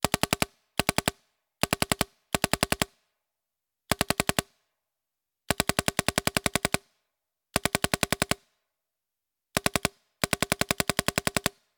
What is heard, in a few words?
Paintball Gun; Sound Ideas; Guns